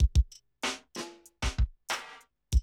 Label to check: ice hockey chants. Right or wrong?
Wrong